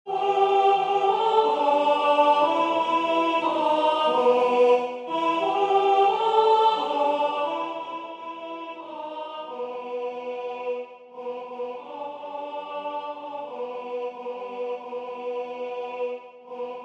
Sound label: alto; légende